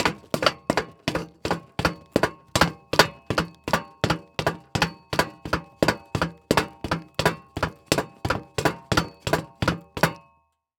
Foley Footstep Hard Sole Dress Shoe Metal Surface Running; Foley Footsteps